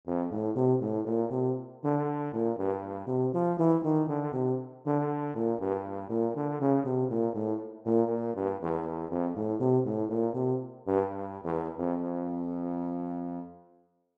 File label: tuba